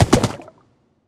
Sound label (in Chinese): 骷髅马水中疾驰声